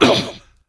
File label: Wound Light; Creature; Varimathras